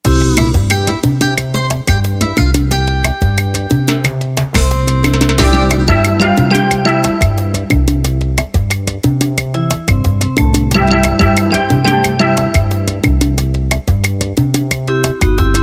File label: Sexy Salsa